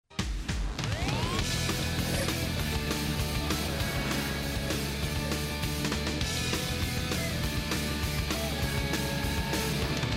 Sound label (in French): musique generique fin